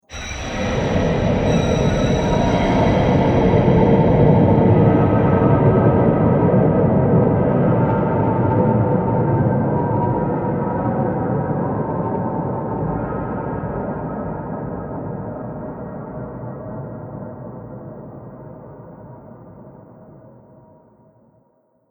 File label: Ambience, Scary Soundscape; Ambience - This Is Horror